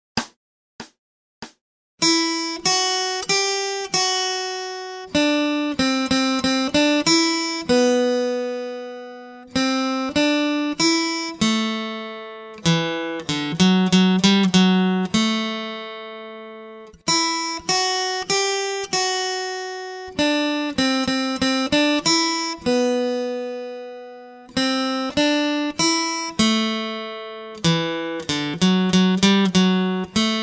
Acoustic Accompaniment, acoustic guitar

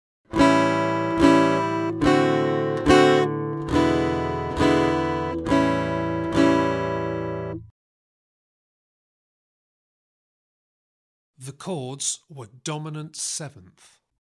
Aural Harmony Test, acoustic guitar; Grade 2

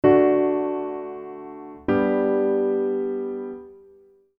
Aural Tests Recog of chords; Grade 2; pop vocals

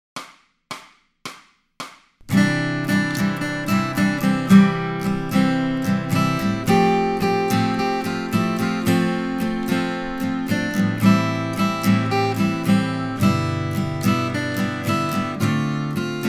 Accompaniment, acoustic guitar; Grade 4

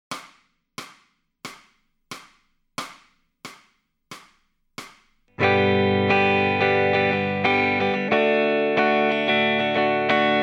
Lead Backing Track, electric guitar

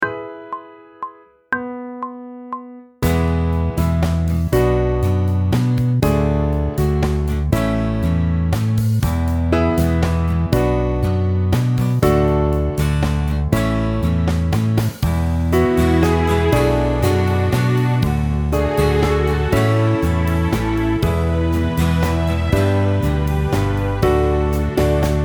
Sight Singing backing track, pop vocals; Grade 7

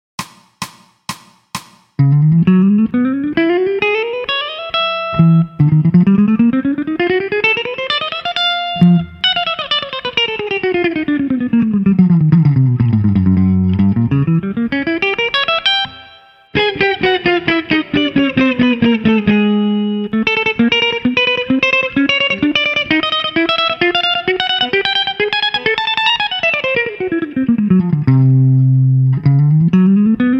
Technical Study, electric guitar; electric guitar performance diploma

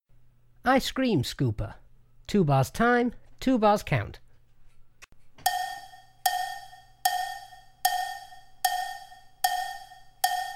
Ice Cream Scooper, drum kit

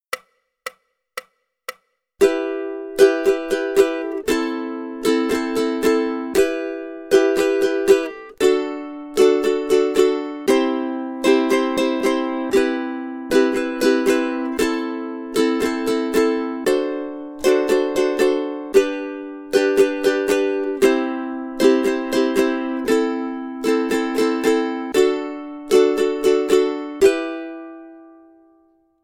rhythm study, ukulele; grade2

